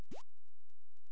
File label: CHIRP, Sea Sounds